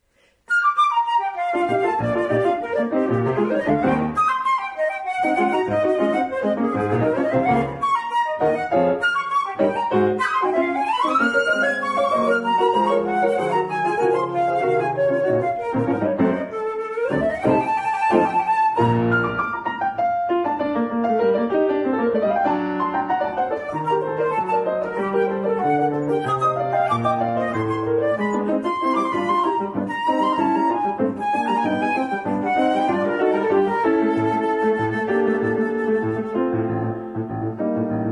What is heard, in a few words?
Flute Sonata